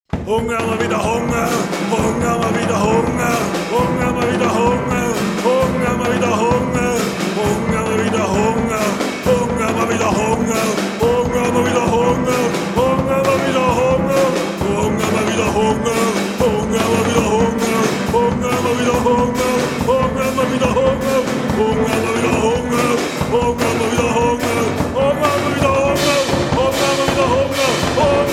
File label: Song